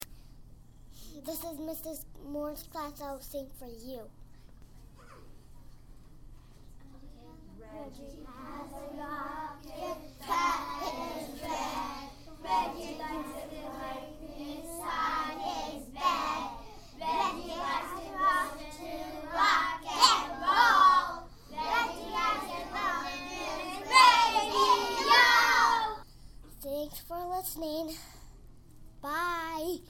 rooster; reggie

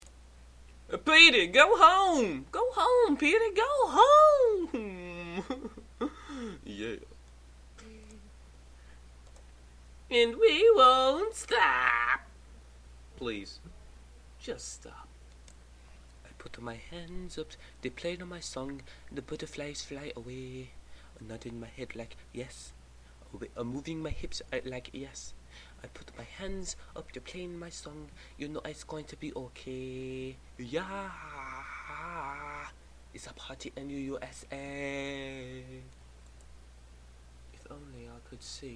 hahahahahaha

